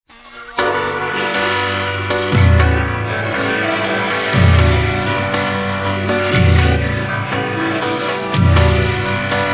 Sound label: bgm